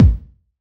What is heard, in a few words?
BD, BASS DRUMS; EdgeSounds